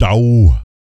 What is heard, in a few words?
PERC, Vocal, Jazz FX, BASS PERC